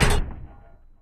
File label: cannonbolt clunk; Ben 10 - Hero Time Game; ThrillGames